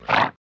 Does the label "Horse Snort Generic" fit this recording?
Yes